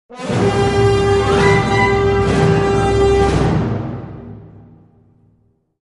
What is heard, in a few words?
battle suspense; Knights of the Old Republic II-The Sith Lords